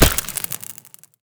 egg Hit

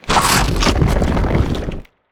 damage

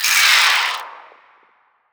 SPINELING dead

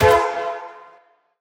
Toy Hammer Hit

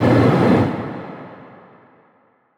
CARRIER brain Hit

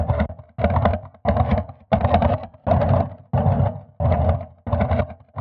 Pump Broken

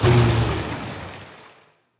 harpoon Move Stop; CARRIER

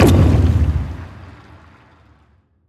harpoon Launch

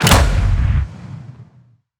harpoon Coil Rifle Shot

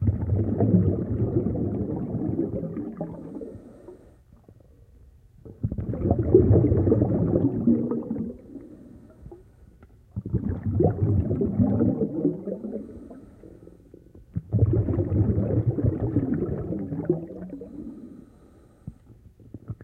Diving Suit Loop

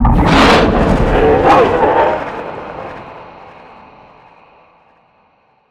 emp

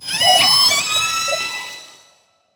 BABY MOLOCH idle

